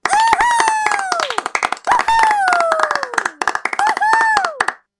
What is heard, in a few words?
celebration; fx; Video Celebration